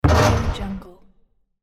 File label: basketball hitting backboard